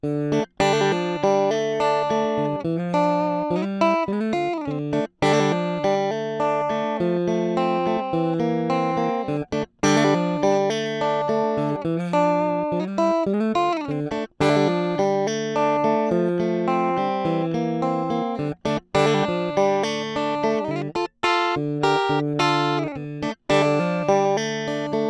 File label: gk3 gp10 strat